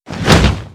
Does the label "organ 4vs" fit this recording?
No